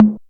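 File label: 808 high Tom; Quasimidi Rave-o-lution 309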